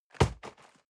box hit hard bounce

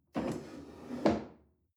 chair wood place slide soft

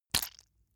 leather impact mud hard squelch

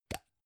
gum plastic box open

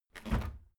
washing machine tumble drier door open

